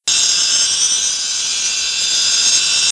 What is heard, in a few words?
Willowisp Loop; Playable Tutorial Level